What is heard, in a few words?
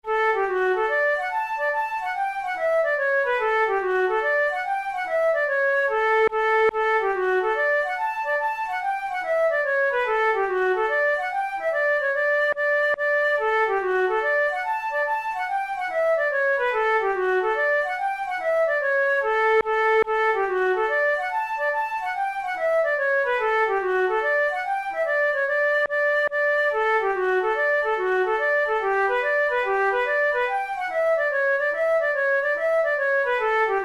hornpipe, flute tunes